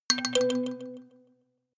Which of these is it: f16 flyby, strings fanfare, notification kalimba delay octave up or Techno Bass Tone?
notification kalimba delay octave up